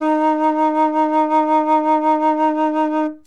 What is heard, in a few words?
flute; Winds